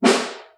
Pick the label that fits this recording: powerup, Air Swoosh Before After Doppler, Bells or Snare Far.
Snare Far